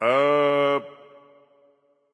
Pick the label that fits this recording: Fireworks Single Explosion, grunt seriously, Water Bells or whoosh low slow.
grunt seriously